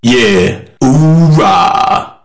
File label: levelup; QTM CodMod